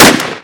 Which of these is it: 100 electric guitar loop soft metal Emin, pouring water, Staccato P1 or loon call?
Staccato P1